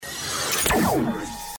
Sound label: laser fire; ThreeHeadedDragon